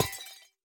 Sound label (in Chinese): 铜灯破坏声